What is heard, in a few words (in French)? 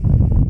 feu; Jailbreak 1.01